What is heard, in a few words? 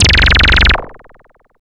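FX left, attacks; Best Service Advanced Media Trax Modern Composer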